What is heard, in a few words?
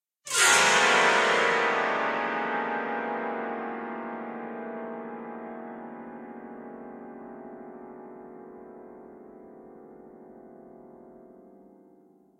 piano notes; SyrinScape; Madness in the library